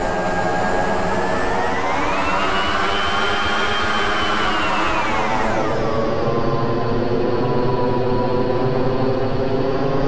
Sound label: sp loop, space; Select Soft Publishing Stock Clips Multimedia Clips